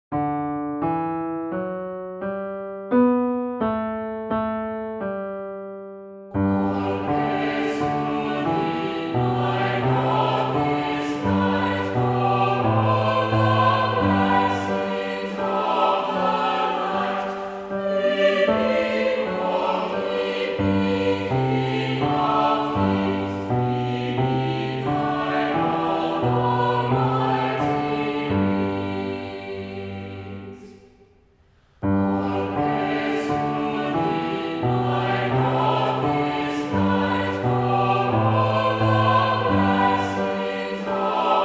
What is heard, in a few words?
bass; All Praise to Thee